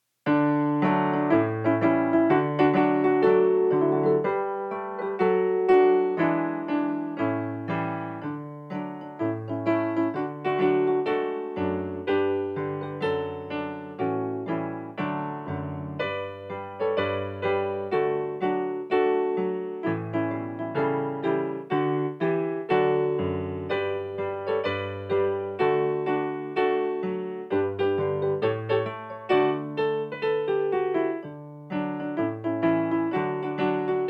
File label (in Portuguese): playback hino